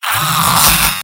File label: pain severe; engineer; tf2; mvm